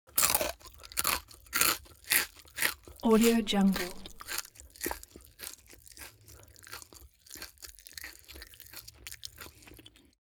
human mouth eat crisp mouth open

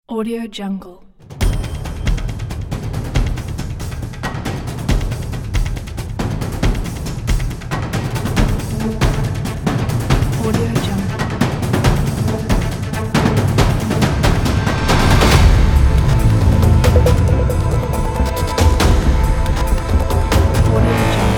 epic action hybrid trailer

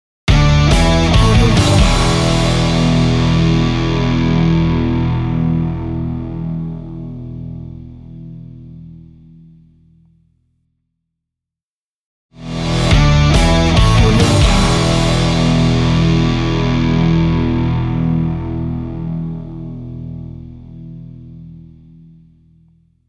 rock guitar logo

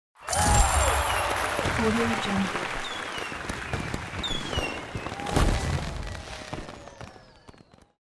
huge win

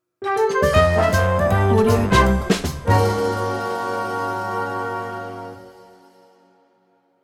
jazzy sitcom stinger transition

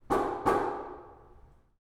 door knock; prosoundfx

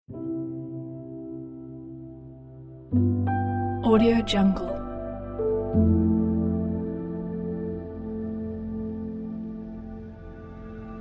magical garden

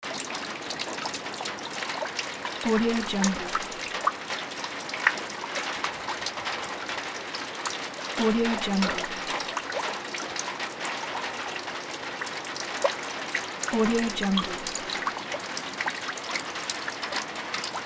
rain

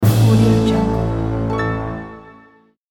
orchestral bonus award

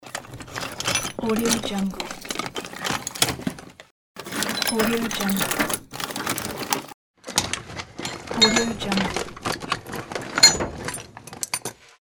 searching for metallic tool; audio therapy

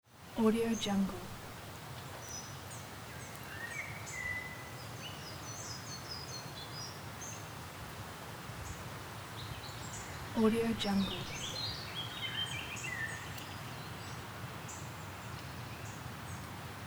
forest and birds

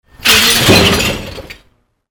trash; 3dhome

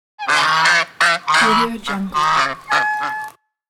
geese

